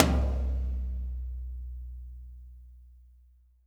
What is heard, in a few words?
TOM, WET WHACKER; Spectrasonics; Burning Grooves CD2